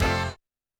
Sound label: percussion, Roland TD-20